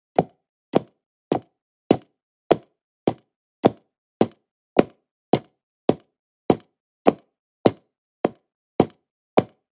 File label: Footsteps